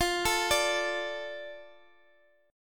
D 5th F; D5/F; arpeggiated; JGuitar

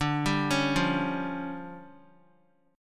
D Minor Major 7th Double Flat 5th, D, Guitar; DmM7bb5; arpeggiated; JGuitar

